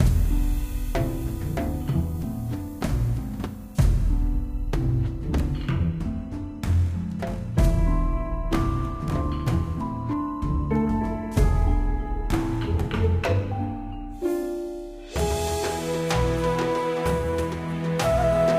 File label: Jungle; James Cameron's Avatar The Game